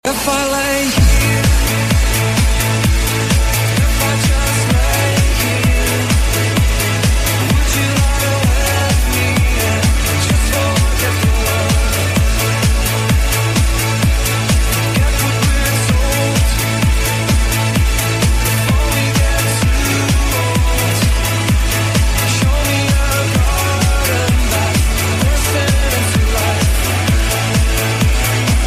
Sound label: techno